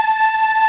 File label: Ending loop